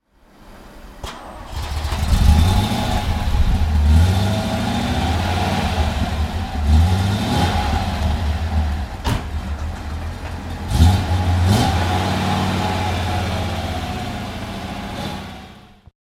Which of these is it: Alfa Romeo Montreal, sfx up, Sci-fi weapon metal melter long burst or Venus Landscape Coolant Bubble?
Alfa Romeo Montreal